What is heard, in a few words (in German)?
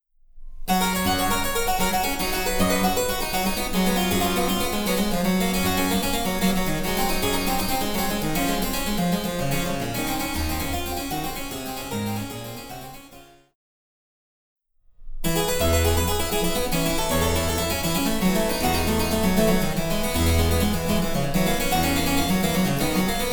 Cembalo; Mark Lindley WTC 1